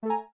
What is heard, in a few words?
error; readwellApps